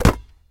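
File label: short clear sound of bat hitting ball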